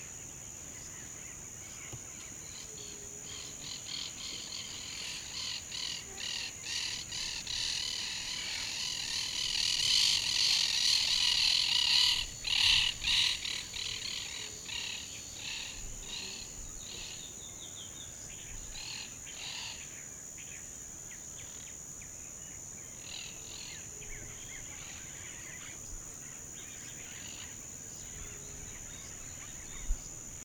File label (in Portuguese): Caturrita